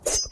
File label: Disk Throw; Saros